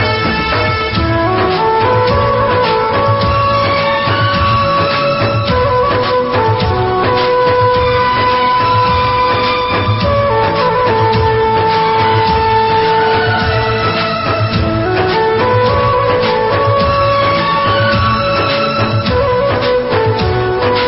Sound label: theme music ringtone; mann